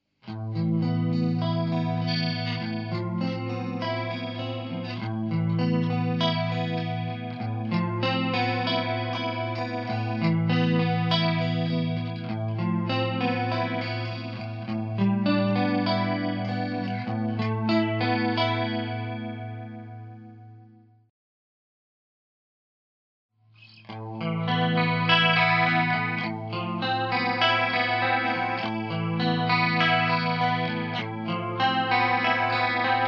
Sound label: Chorus tonelab st; gitar